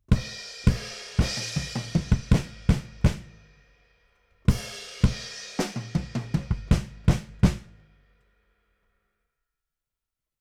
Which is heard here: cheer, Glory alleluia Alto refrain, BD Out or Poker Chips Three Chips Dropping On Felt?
BD Out